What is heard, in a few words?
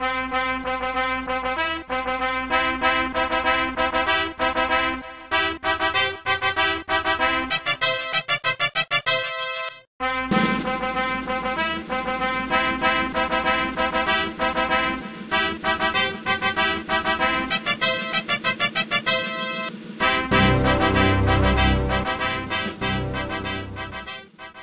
victory; rocky; gba